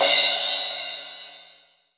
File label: rock crash, Crash Cymbal, mono crash; AKAI S6000 CD-ROM - Volume 3